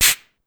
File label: Cabasa, Pitched Down; Clavia DDrum-AT